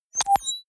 confirm high tech beep, signale; soundpool

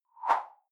whoosh simple short tonal high

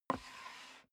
step boots wood creak slide long; schritte

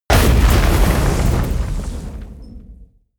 truck crash large; whooshes rises; hits impacts

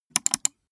gaming mouse left click double; maschinen; buero

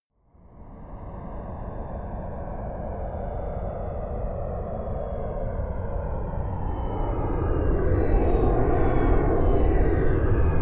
plane boeing 787 flyby right to left distant; flugzeuge verkehrsflugzeuge; verkehr transport

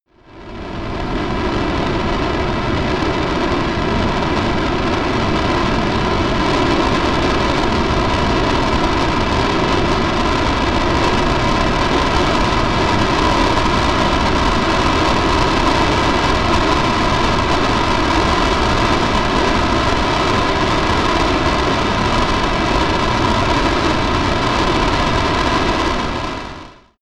military jet b-1b lancer turbine rev up; Airplanes - Jets

